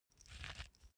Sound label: small pepper grinder glass ringing soft; industrie-wirtschaft; gastronomie